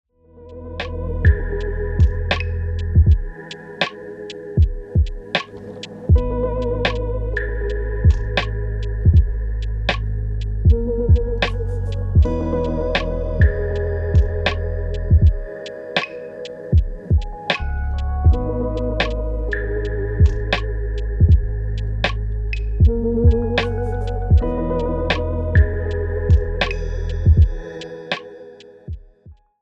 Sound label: Beat